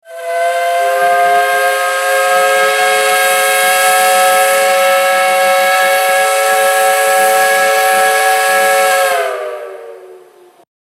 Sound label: Whistle short